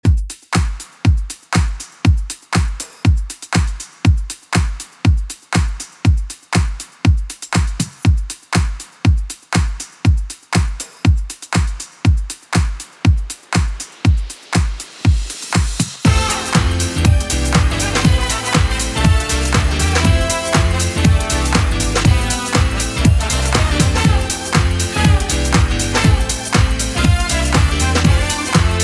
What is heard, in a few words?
80s Club Edit 120bpm